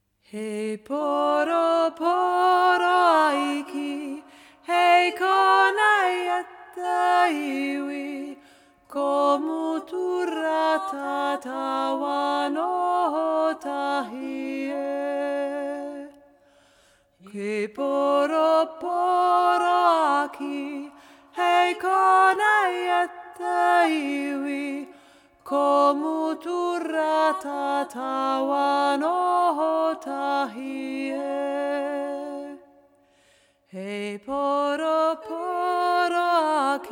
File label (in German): maori hauptstimme